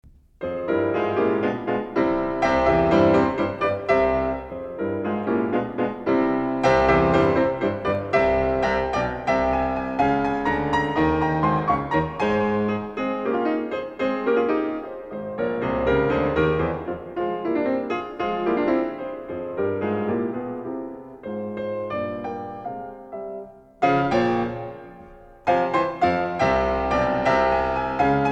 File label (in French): Sonate Pour Piano, Allegro Molto